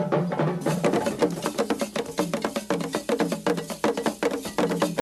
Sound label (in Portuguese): Audio batuque; Gualaxo do Norte: entre vissungos e procissões